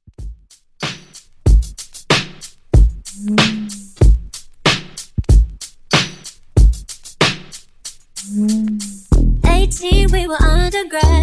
Top40 Mashup 94 Bpm

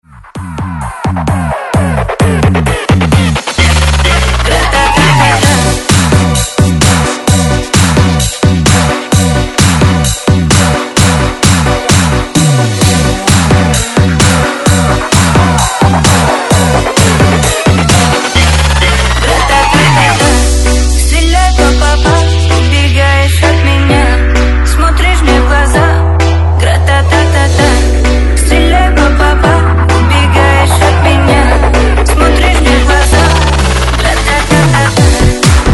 JUNGLE DUTCH 130 BPM; DJDOLLS

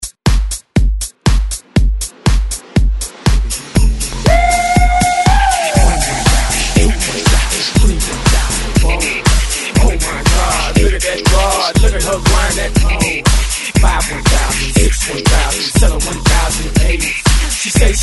ReDrum 120 Bpm; DJDOLLS

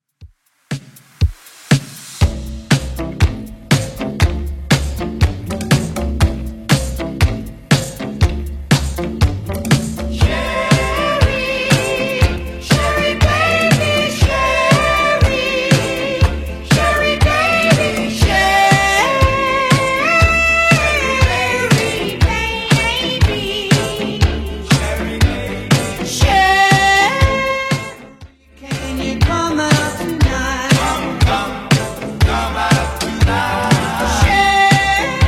50s Redrum 120 bpm

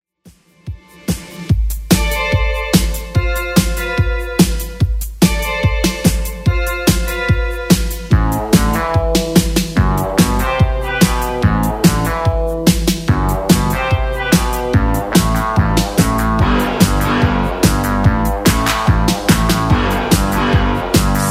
80s Redrum 145bpm

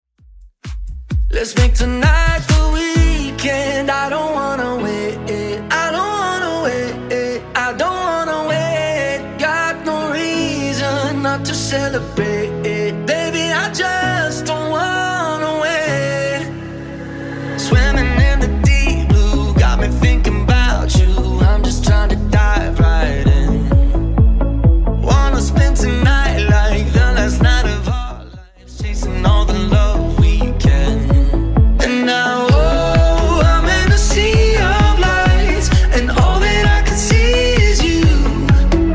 Top40 130bpm; Xtend Edit

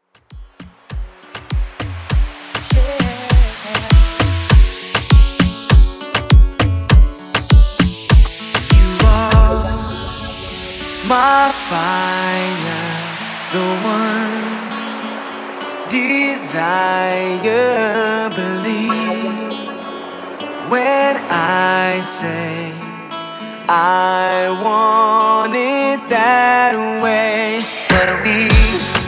Afro Remix, 100Bpm

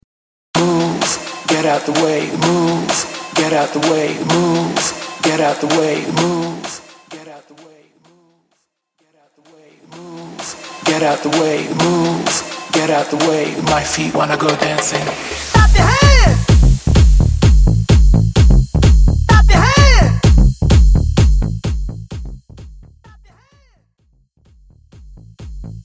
Clap A Pella In House; Mainstream VIP; Vegas Edit; 125 Bpm

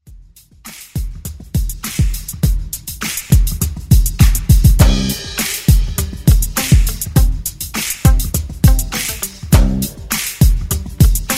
70s

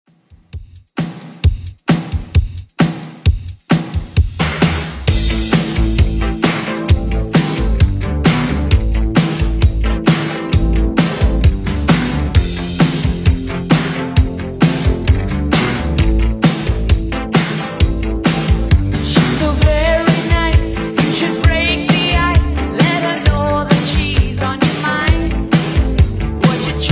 80s Redrum 132 bpm

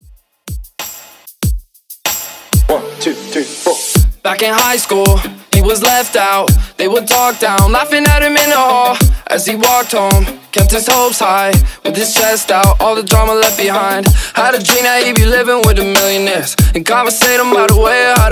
Top40 ReDrum 95 BPM

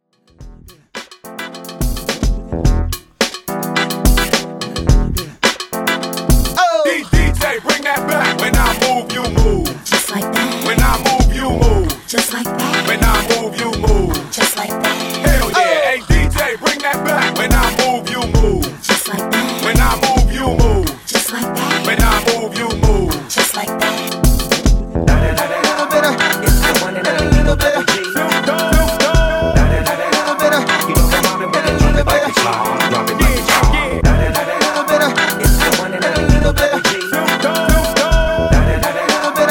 Party Intros 107Bpm

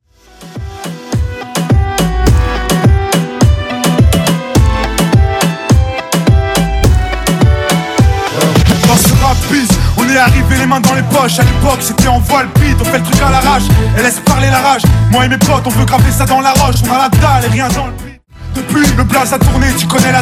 Moombahton Remix 105bpm

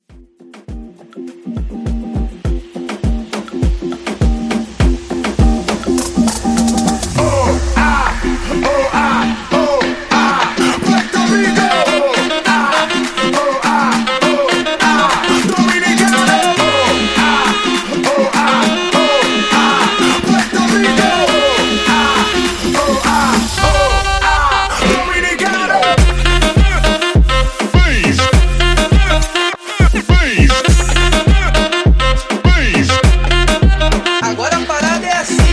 Moombah; Quick Rework

